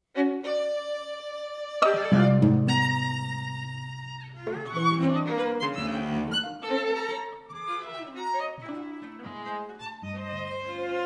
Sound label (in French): Quatuor; Boulez; Livre Pour Quatuor Révisé